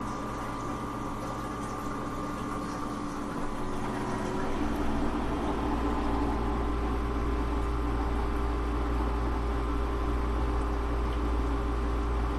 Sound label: Ambiance Watery, washing machine